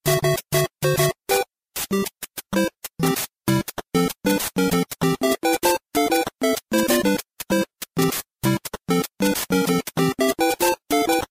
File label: background